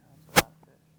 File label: mechanical pops wireless lav system